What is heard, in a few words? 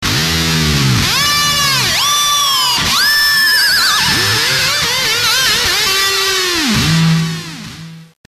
Whammy